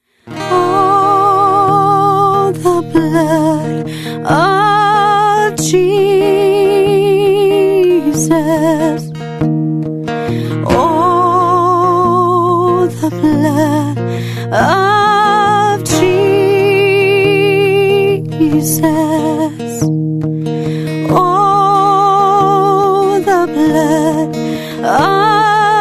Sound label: alto